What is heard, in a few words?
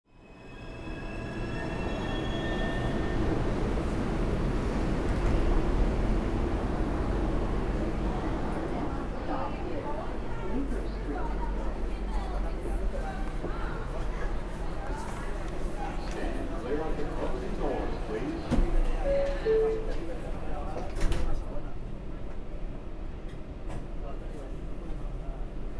Subway Line 6 to Brklyn Bridge